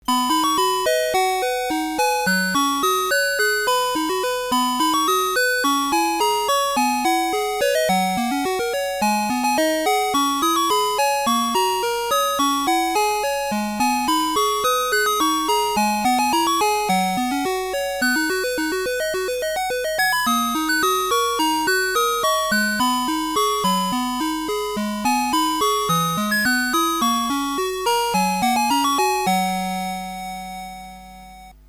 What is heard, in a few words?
8 Chord A